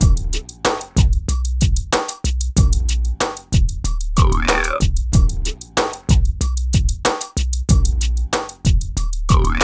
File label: BEAT, Beats 93-96bpm; PlatinumPhattCD1; E-MU Producer Series Vol. 8 – Platinum Phatt